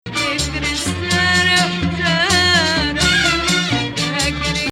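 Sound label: Mustaar, maqam; Rast; Musta3ar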